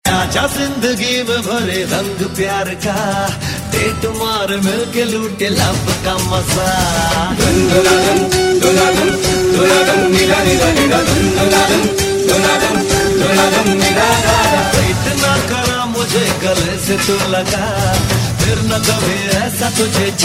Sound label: Ringtone, Bollywood